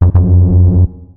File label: buzzer